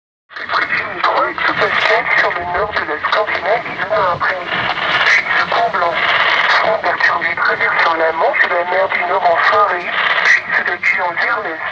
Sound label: Vocal